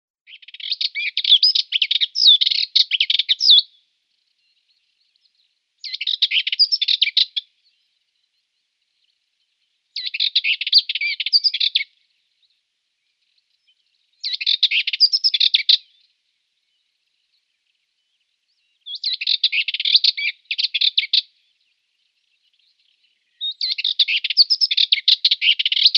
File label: Dartford Warbler song